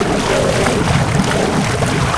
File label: water shoot big